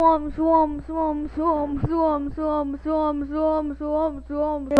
swarm

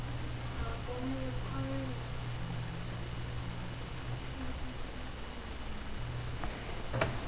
ghost

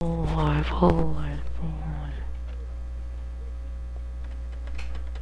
boar

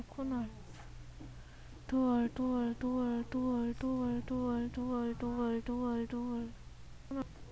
door

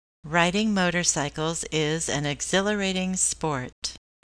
motorcycle

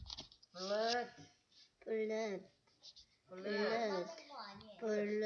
flute

